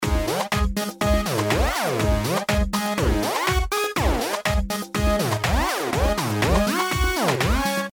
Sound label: synth laser